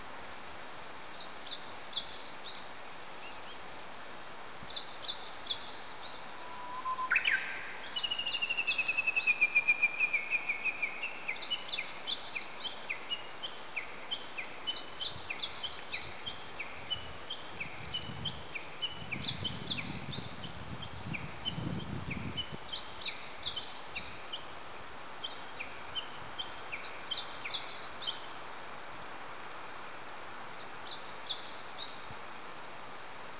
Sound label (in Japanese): uguisu